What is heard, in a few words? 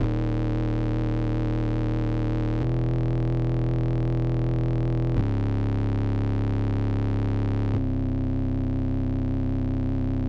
140 bpm Bass; remix fragments